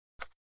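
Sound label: Poker Chip Movement; casino games; chip mvmt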